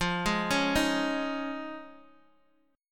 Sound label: F Minor 7th Sharp 5th, Guitar, Open D